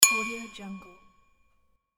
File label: modern button click